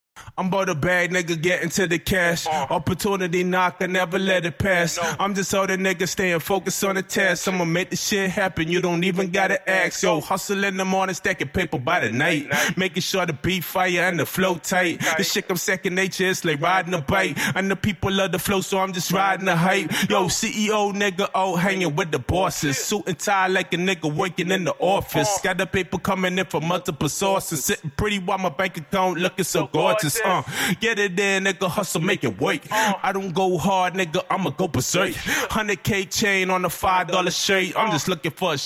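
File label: hip hop, 190, F minor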